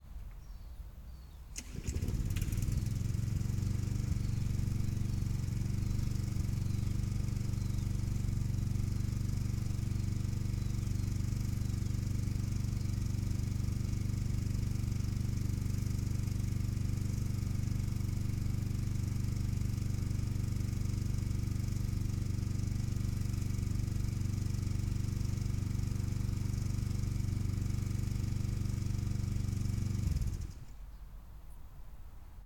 Lawn Mower - EXT - IDLE - Start Run Off - Turn Point; Pole Position; The Garden Tools Library; ORTF; MKH 8040